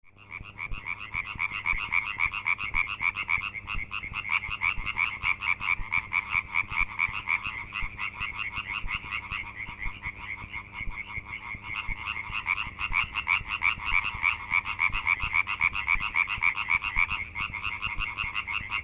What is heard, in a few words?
Hyla arborea